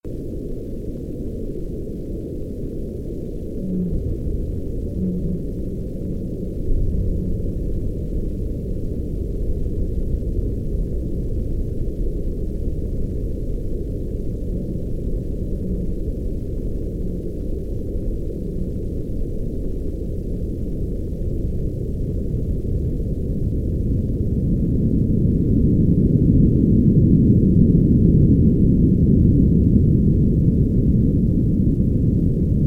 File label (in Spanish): sonido Challenger Marianas; los extraterrestres